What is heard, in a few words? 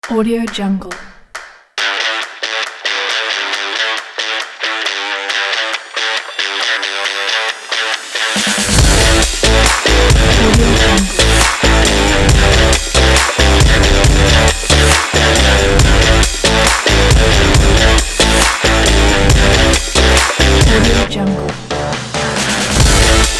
fun sport big beat aggressive rock